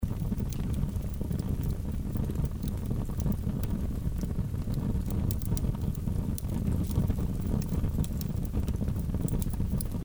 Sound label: torch; Keep Out! A Dungeon Adventure for the Brave